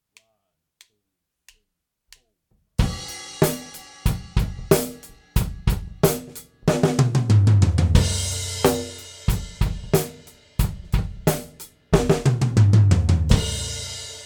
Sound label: Gate Mix; Drum Recording Setup